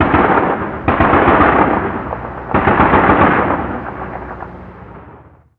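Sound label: gun 50cal distant; ModernWarfare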